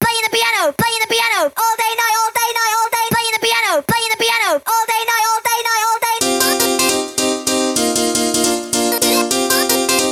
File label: Piano Song